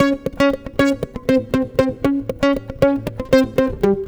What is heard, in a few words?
guitar, stringed instruments